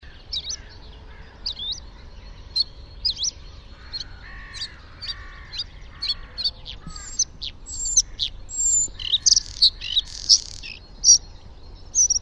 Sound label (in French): Gorgebleue a miroir, chants oiseaux